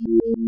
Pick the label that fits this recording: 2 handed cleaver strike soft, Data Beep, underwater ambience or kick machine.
Data Beep